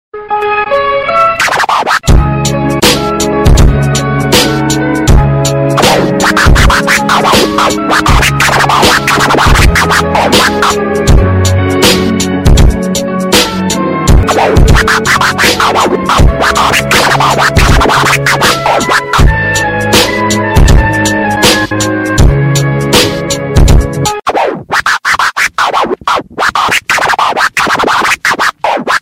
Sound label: scratch